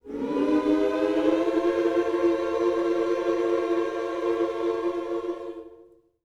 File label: MM MINOR, Vocal Gospel